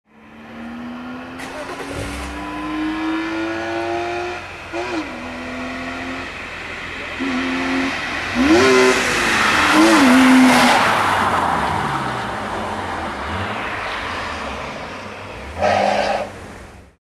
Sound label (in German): eisenmann 323ti vorbeifahrt